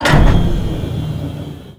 engine off; gmod; lvs barc speeder test

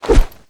fist swing; tfa starwars melee weapons pack

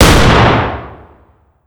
fire dist 12ga int; gmod; arccw uc commons attachments

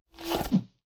woodbat draw; melee weapons; tfa starwars melee weapons pack

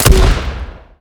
fire, 40mm; gmod; arccw uc commons attachments

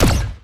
shoot; kingpommes; starwars; atrt

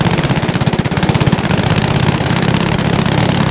mg light loop interior, weapons; planes; gmod; lvs planes

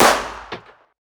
fire dist int pistol light; gmod; arccw uc commons attachments

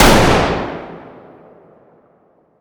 fire dist int rifle; gmod; arccw uc commons attachments

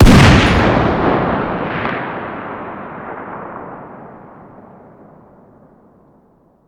fire dist 50bmg rif ext; gmod; arccw uc commons attachments

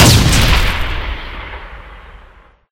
impact, turret; gmod; lvs star wars

